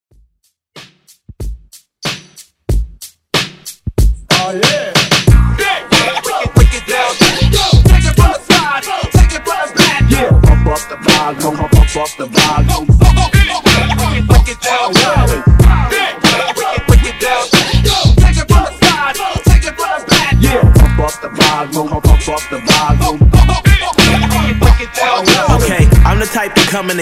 redrum hype

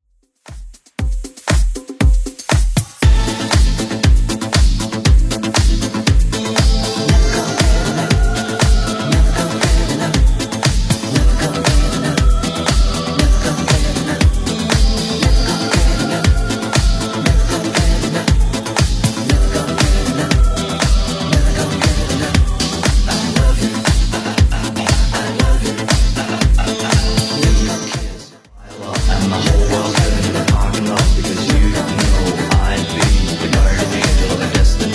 80s Club Edit 118bpm

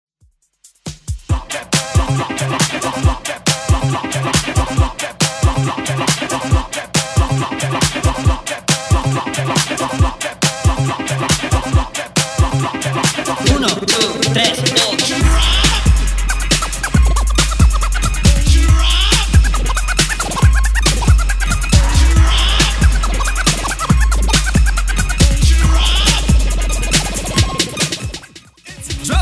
90s Old School DJ Intro Outro Redrum 138bpm

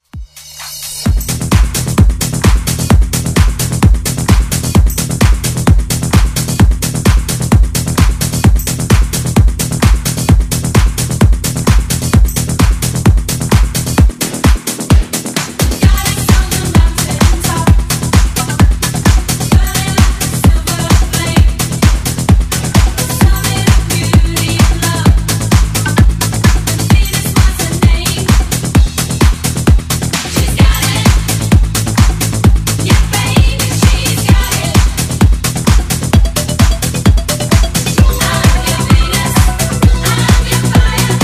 Remix Dance Ext Redrum

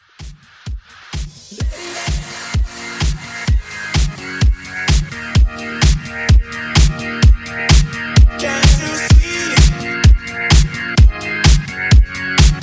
Halloween Mashup

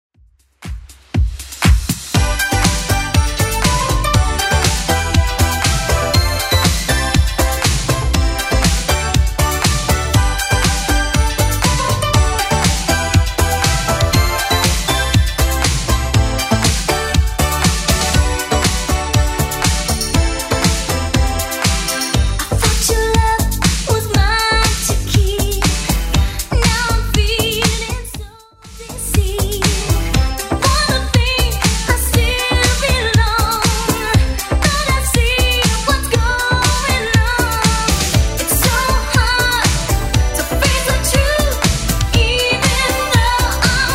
80s Club Edit 120bpm

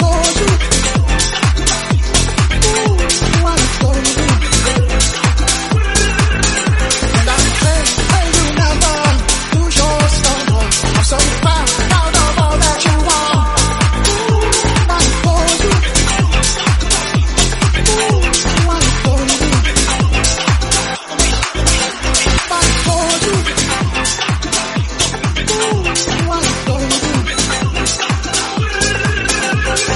Snippet 126bpm Am

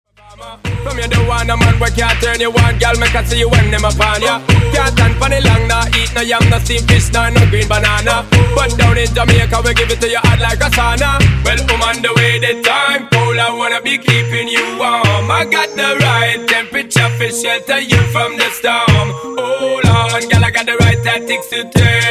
Funk Segue Bootleg 125

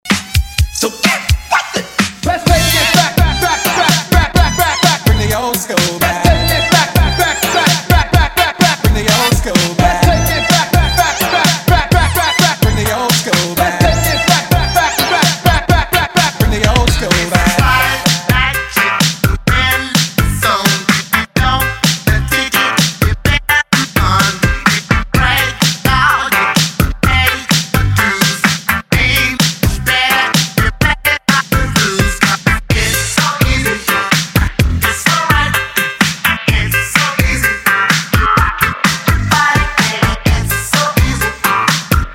Classic 80s

